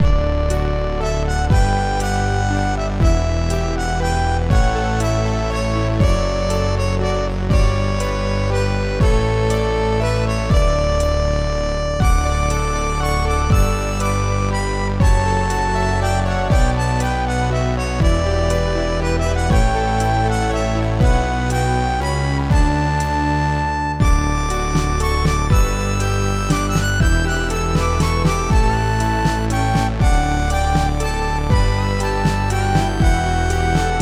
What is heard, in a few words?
Waltz Extended